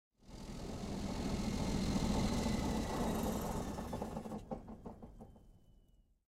leo2a5 engine stop; War Thunder

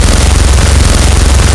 gatling fire loop; Modern Warfare; minigun

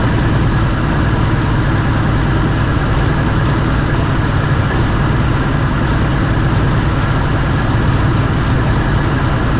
su 100 engine rpm 33 load; engines; War Thunder

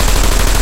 loop vz37 origin; guns tanks

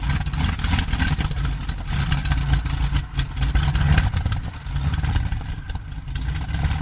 Aircrafts Engines DB605 Cold Start; DCS

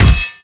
arm airpunch

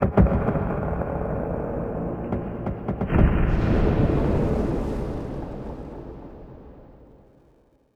city occasional

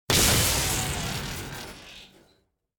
plane explosion

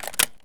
m1a mag in; Escape from Tarkov

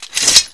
Inf Weapons Foley 3rd; Red Orchestra 41-45

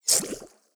bullet flyby underwater; Rust

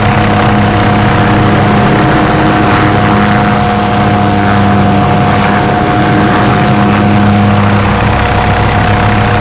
littlebird move; Call of Duty Modern Warfare

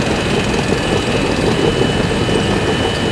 Mod Armour Loop; Civ4 warlords

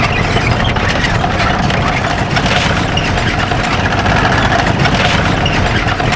Vehicle Engines; Red Orchestra Ostfront 41-45

